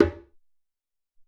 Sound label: Djembe V, Alesis SR-18 Drum Machines; 300 Drum Machines